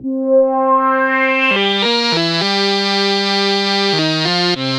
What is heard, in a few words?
Arp C Line, ARP Odyssey; musicradar-synth-samples